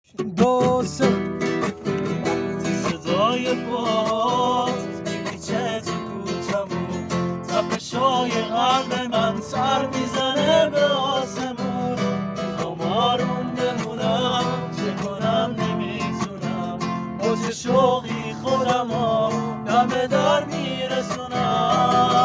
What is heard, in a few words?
Guitar Music